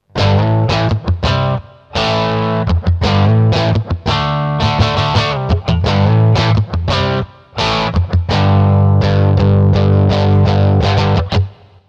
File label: scratch slomo, guitar